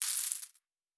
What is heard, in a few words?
Coins; Fantasy Sounds Bundle